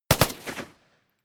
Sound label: rifle ak-47 exterior fire double; comedy-cartoon; Pistols and guns; pistolen-gewehre